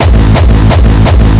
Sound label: 170 destructo hardcore kicks, drum loops and breaks; 161 to 180 bpm